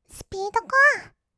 スピードコア; 1200 kawaii voice!!; 音楽ジャンル 56種

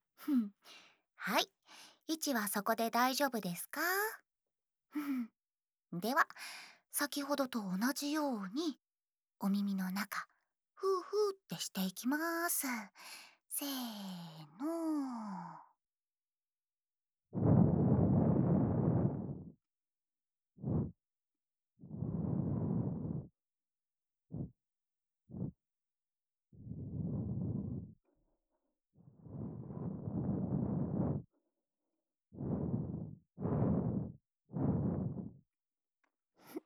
左耳の 耳かき; 最後はこっちのお耳を……♪

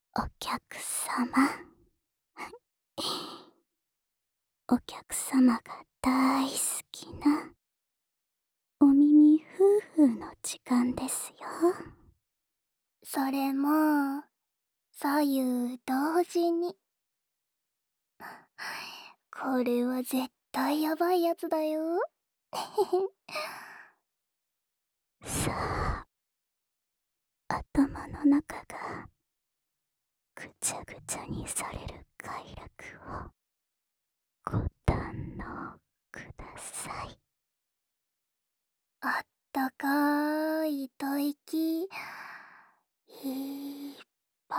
耳吹き 二人, ASMR

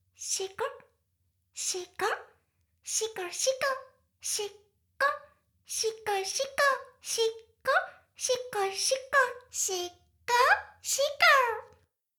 シコシコ音声; ASMR; 魔法少女マスカリマッスル

